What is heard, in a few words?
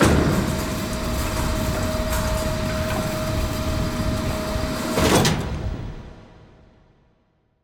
gate Open